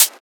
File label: Closed Hat; FLPRO